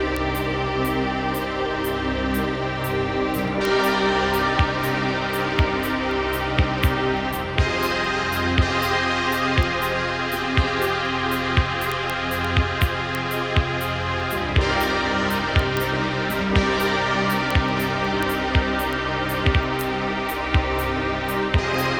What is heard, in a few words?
music with errors